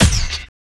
BD, Drum & Bass, 160 BPM

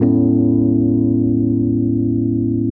RHODES; Houseworx

